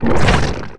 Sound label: entangling roots decay